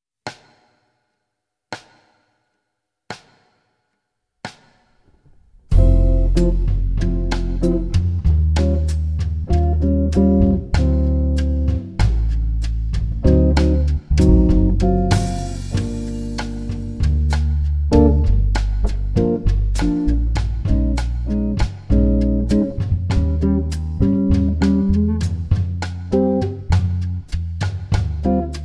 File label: Bossa; Jazz Harmonia